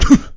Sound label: piglette collision; Angry Birds Space